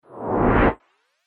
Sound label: Whoosh; Media Fx; Whoosh Sound Effects